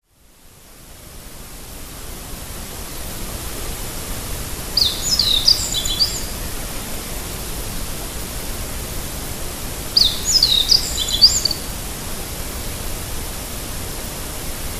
hojiro

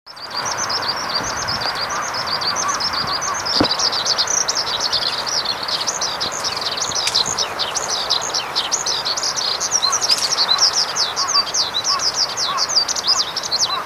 hibari

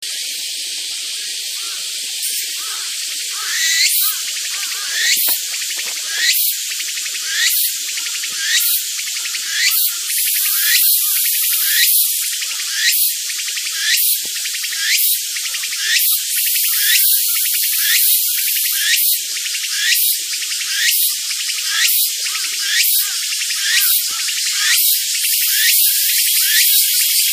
tsukutsukuhousi

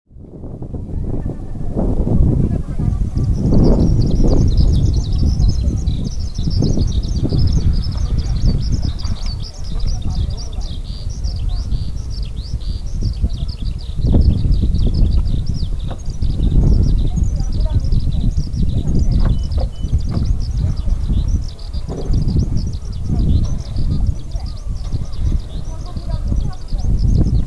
Hibari

